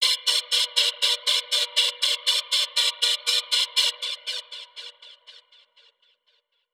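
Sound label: Modulation Proc, alarm siren samples, 120bpm; musicradar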